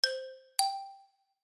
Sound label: ascending 5th